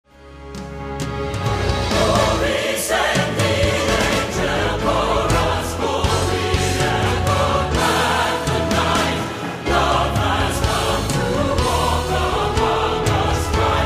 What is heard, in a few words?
orch track; Word Music